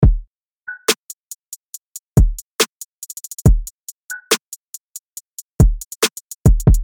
Trap (140BPM)

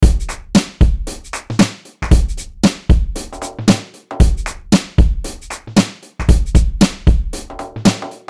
Neo-Soul Demo 120BPM

